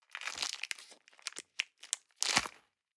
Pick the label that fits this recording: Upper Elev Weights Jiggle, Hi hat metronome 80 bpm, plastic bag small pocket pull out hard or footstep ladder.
plastic bag small pocket pull out hard